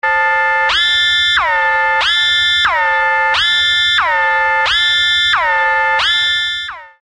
Nuclear Power Plant Alarm

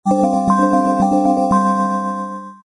bubble notes

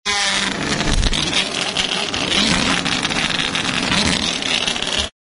big balloon exhaust

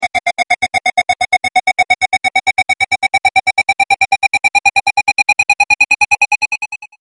taking off

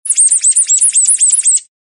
comedy really squeaky

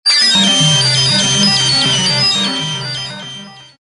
beep mixed scramble

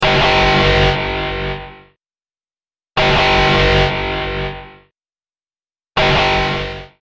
Attack Alarm; Mars

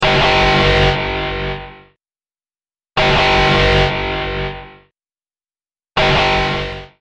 Radioactive Alarm Red Zone

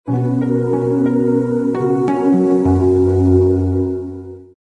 tranquility tone

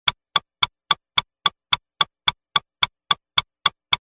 timer constant ticking

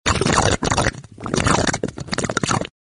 mud mouth

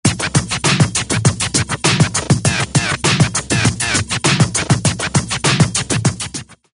raw beatz scratch

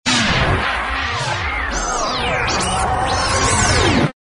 confusion

